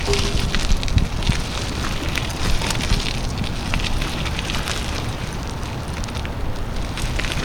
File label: Tire Rolling On Gravel